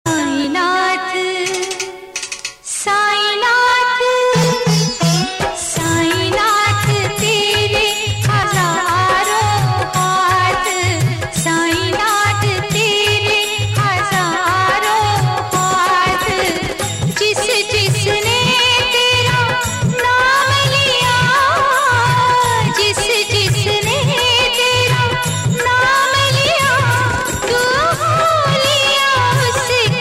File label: ringtone